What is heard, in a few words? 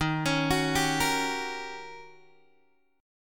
D sharp 7th Sharp 9th Guitar Open G